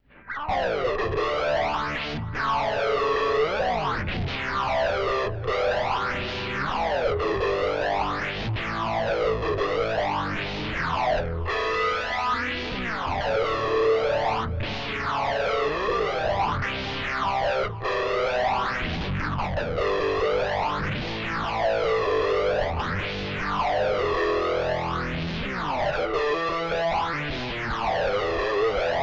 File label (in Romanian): flanger distors; tc electronic nova system